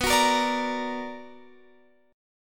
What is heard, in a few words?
B Minor Major 7th, Guitar, Open F; strummed; JGuitar